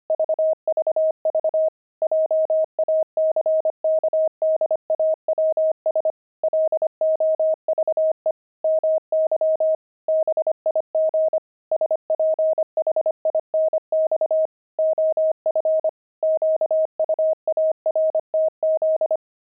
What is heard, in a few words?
pangram, intermediate pangrams files; jackdaws